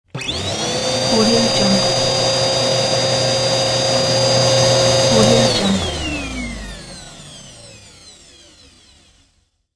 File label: vacuum cleaner